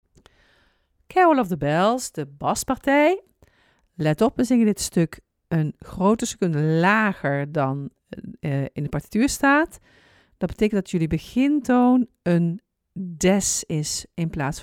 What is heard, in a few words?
Carol of the bells bas; koorleden